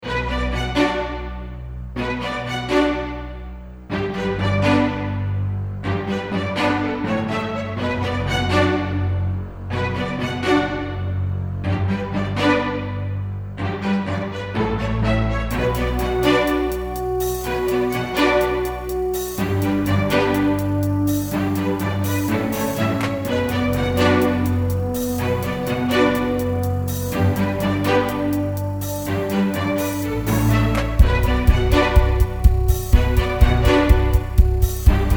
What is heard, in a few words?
Casio Rock